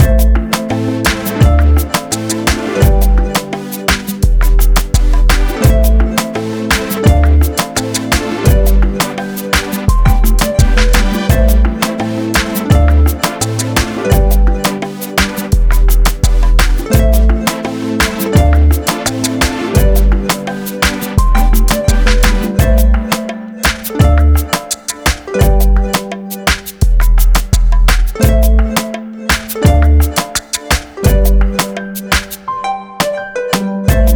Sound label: Reggae